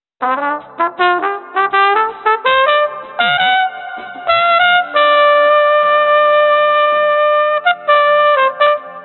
Trumpet 1 8kHz aliasing; Aliasing und Oversampling in der Musikproduktion; 24bit

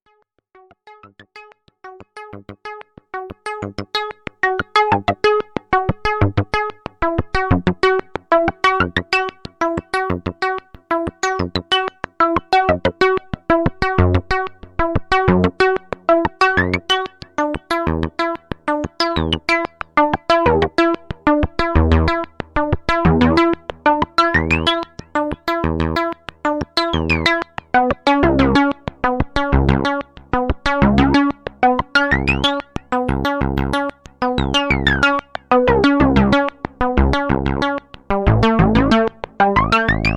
syst100 sync pwm

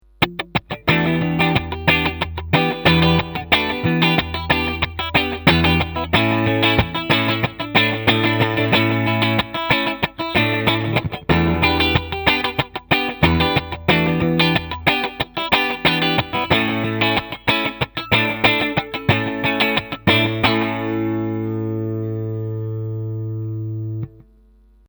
Clean

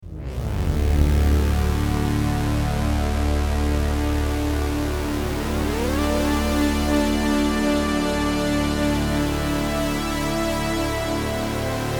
MW2 CS80 brass

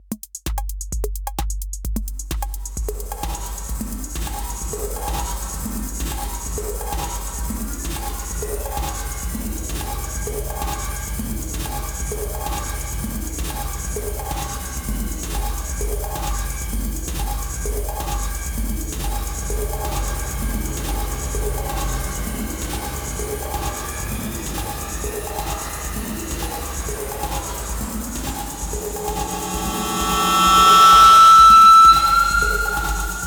Erica Synths Black Hole DSP2 Shimmer Drift

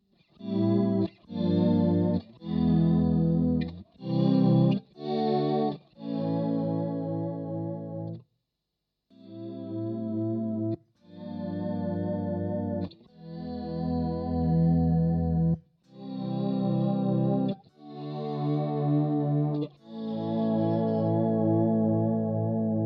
Autoswell

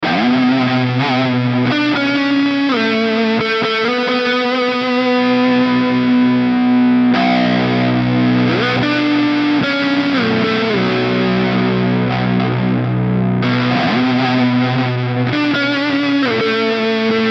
Mooer GE 150 Lead Reverb

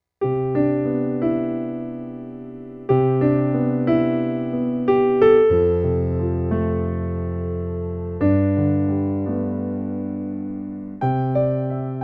Casio PX-S3000 Concert Grand Piano

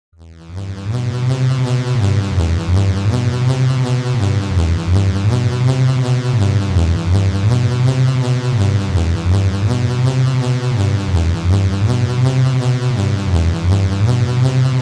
Seq Plucked Lead; Sound Particles SkyDust 3D

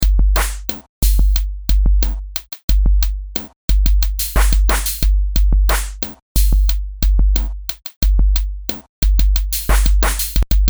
Berlin Electro

